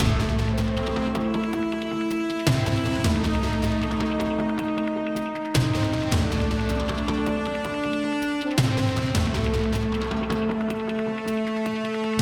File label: Tornado Platforming MIXED; Ben 10